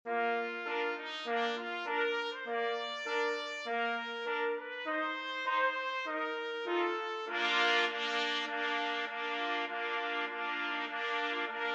battle hymn 4TPT, trumpet quartet

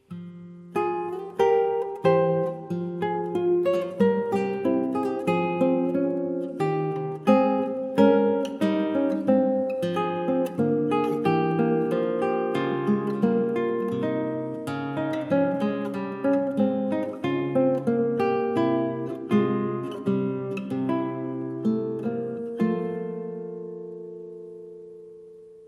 prelude in a minor, piano

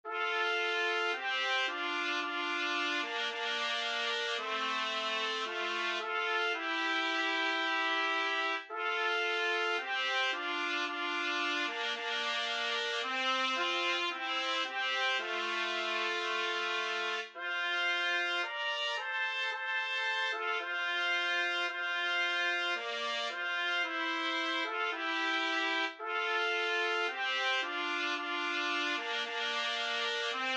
nearer my god brass 2 trumpet

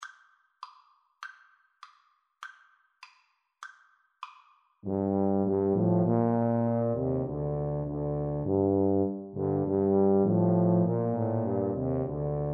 crown roses, tuba trio

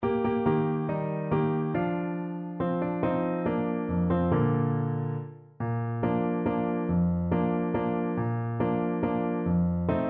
Clarinet

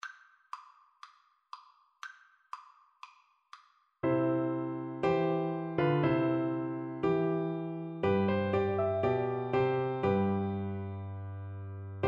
thine be, Flute

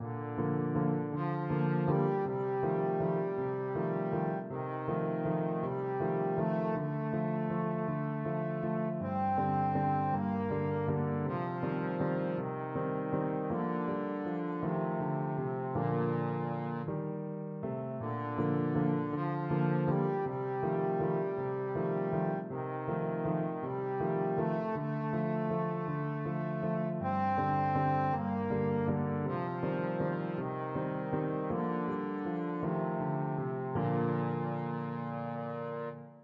waltz trombone

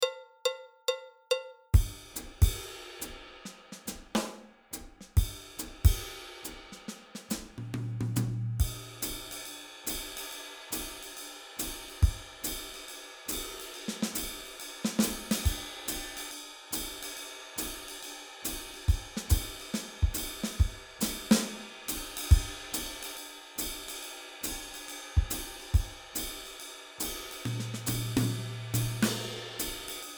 jazzy beat, drums

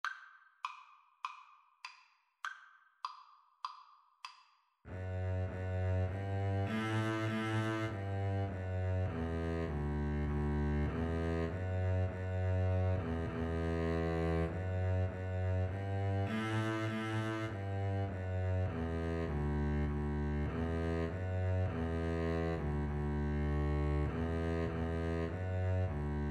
Double Bass Duet

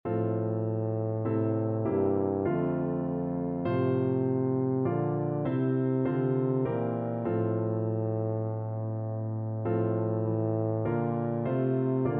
abide with me, tuba

